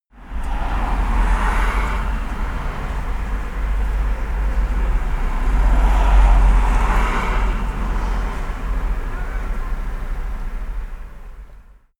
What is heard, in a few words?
the streets